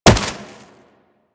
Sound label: Wall Jump Snow; Footsteps; Warframe; Tenno; Wall Run